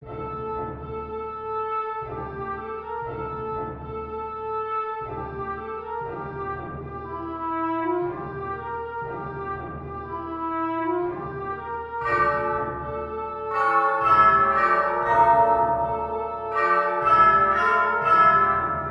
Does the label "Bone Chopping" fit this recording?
No